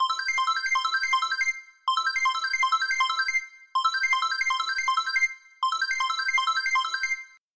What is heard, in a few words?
signal, polifonia midi; budilnik